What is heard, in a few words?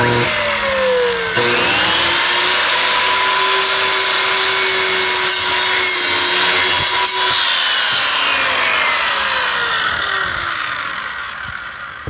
circular saw